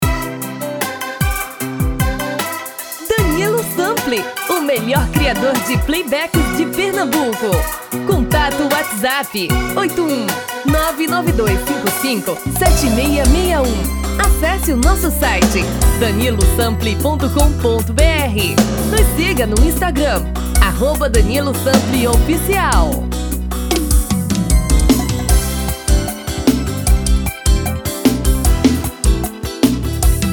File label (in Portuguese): masculino